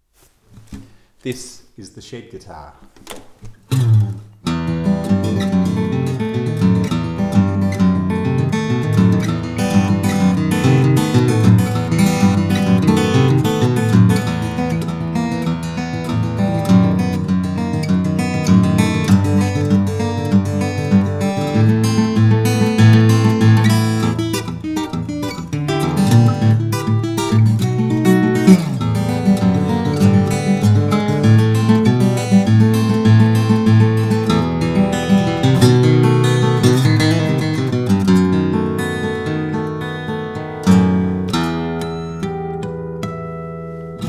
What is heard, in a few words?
Guitar